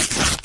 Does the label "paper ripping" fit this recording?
Yes